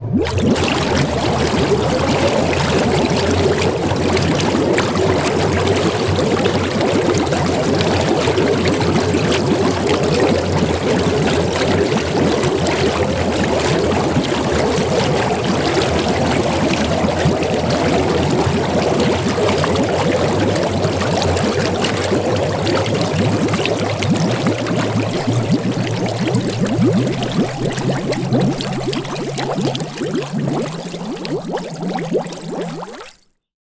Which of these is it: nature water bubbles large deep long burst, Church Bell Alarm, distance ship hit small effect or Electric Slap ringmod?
nature water bubbles large deep long burst